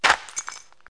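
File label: strike glass; Wired for Sound Pro CD; Aristosoft, Inc.; destruct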